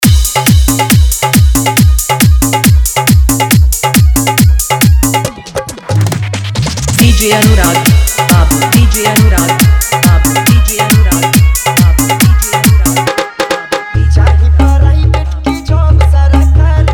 Bhojpuri Fully Dhollki Bass Remix

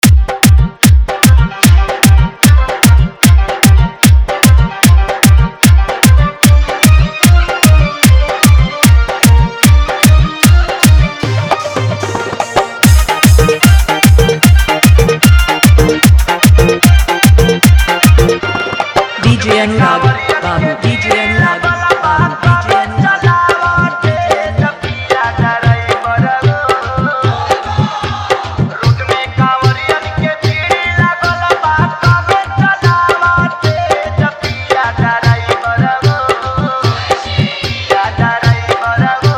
Old is Gold Full Dhollki Bass Rod Show Dance Mix

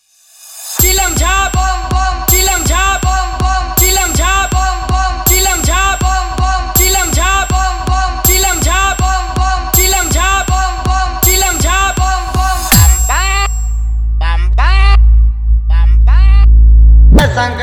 Vaibration Bass Mix

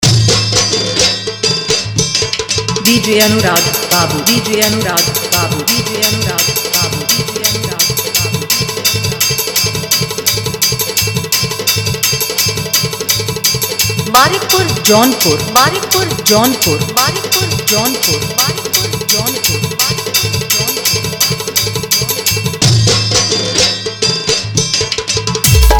Full Dj Remix Dj Remix Hard Bass Mix

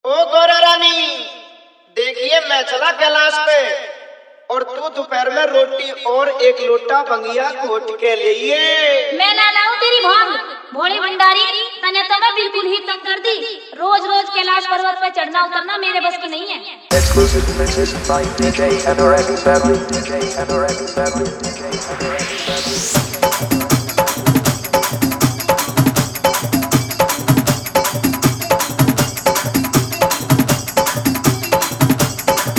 Bolbam Style Full Dhollki Bass Rod Dance Mix

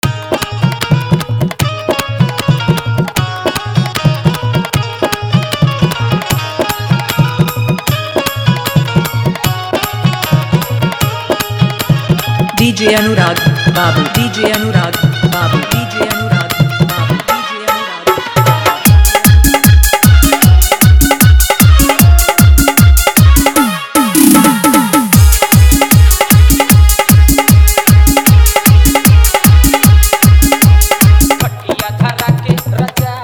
old bhojpuri fully hard dhollki bass mix

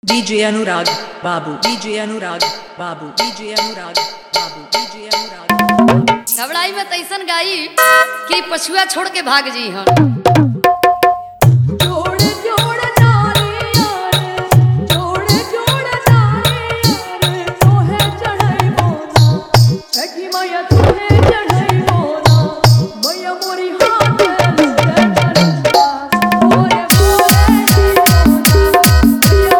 Chhath Faadu Mix

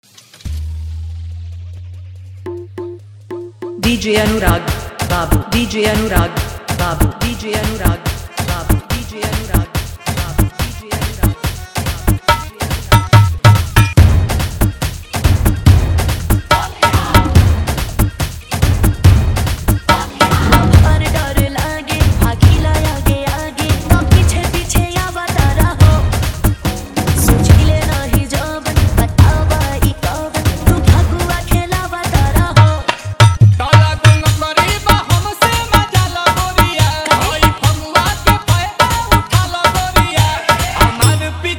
Bhojpuri Holi Hard Faadu Dance Mix

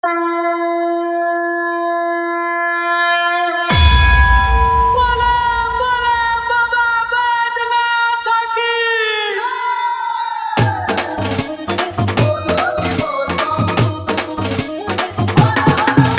Bolbam Full Dhollki Bass Dance Mix

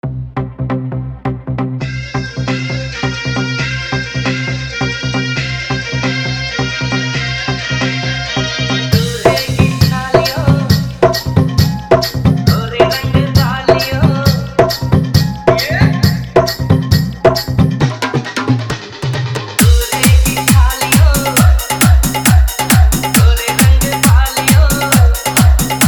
Wedding Full Dhollki Dance Mix; Wedding SpL

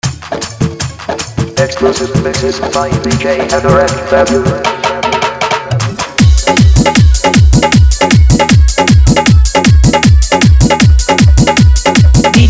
Full Dhollki Bass Dance Mix

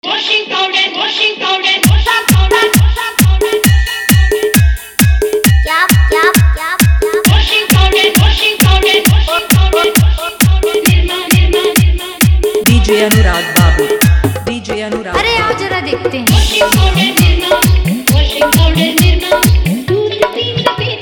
Full Funny Dholki Mixx

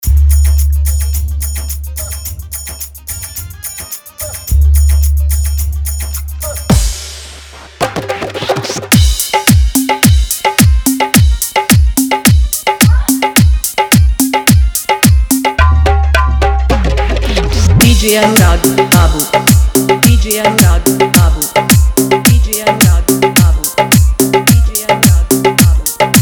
Bhojpuri Song Hard Dhollki

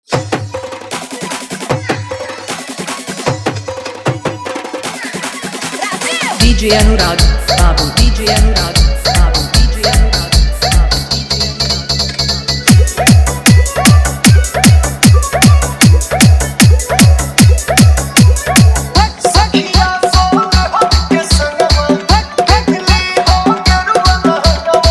Faadu Bass Dance Mix

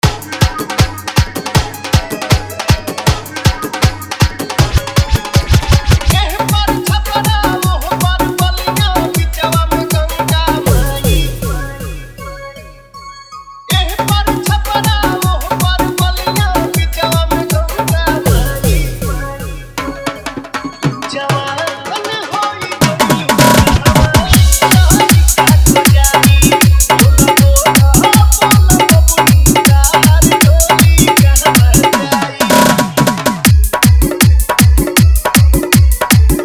Bhojpuri Old is Gold Full Dhollki Hard Bass Mix

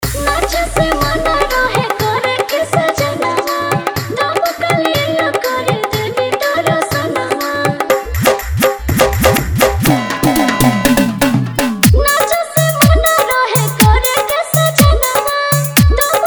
Bhakti Mix

